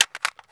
ak47 clip out, no echo

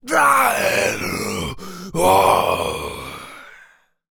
zm scream; cstrike; biohazard

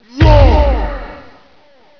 Battle Shout Target; warcraft3

